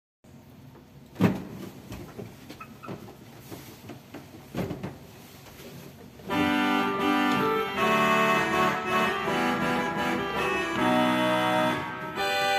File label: Dulcian Regal